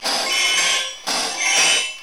SHAKE LOOP; Rarefaction - Poke in The Ear With A Sharp Stick

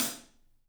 ZILDJIAN HT; 3D Sound; 3D Drums

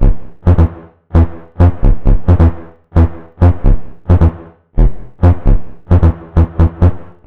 Synth Distorted; Electrocution

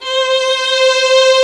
Tron Strings C4; Proteus 2000; Digital Sound Factory Proteus 2000

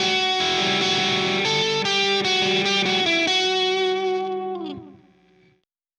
Silos Lead Guitar Riff, Indie Rock; Sonic Foundry (Sony Creative Software); Indie Rock Anthems; Session 11 - Silos

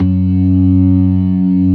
ORGAN, NO PERC

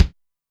kick, Zoom MRT-3B

